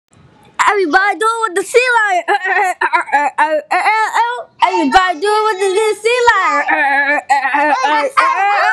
sea lion song

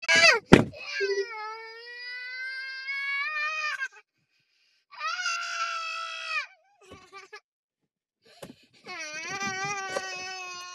super loud crying baby

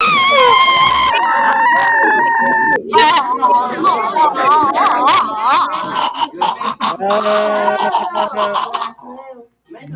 hes choking

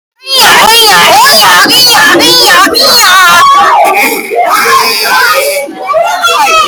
goofy sounds

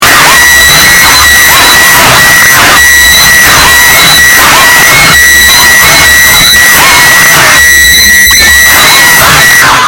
hehehaha; clash royale

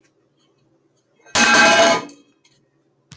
metal pipe drop; meme

